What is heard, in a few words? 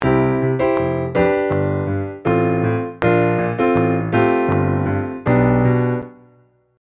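with click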